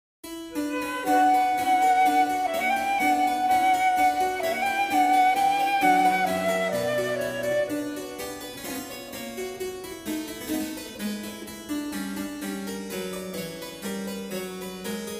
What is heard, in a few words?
Flute